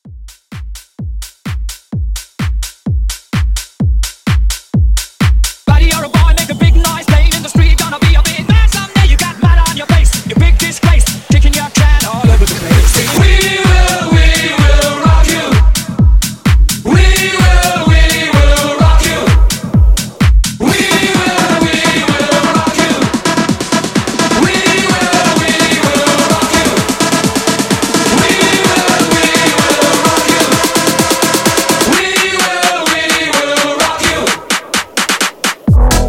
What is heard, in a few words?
Hybrid Mashup